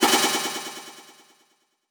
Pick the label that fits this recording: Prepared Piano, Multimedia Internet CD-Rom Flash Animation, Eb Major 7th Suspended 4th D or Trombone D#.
Multimedia Internet CD-Rom Flash Animation